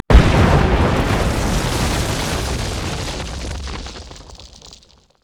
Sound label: turret dying; LoL